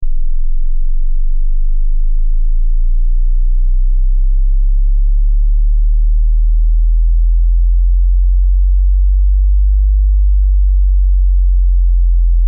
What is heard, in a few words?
Gliding bass wave 20Hz to 50 Hz, test tones